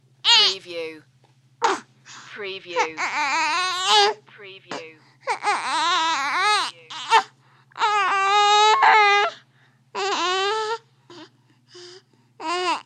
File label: Baby Whining